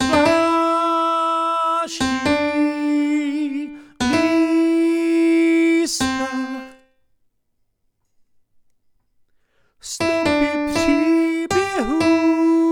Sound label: piano